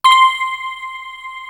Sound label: CHIN BEL, BELLS; East West - Global Sounds